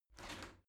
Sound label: shower slide door slide short open